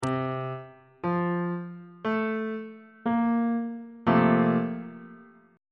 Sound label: b flat major 7th suspended 2nd 1st inversion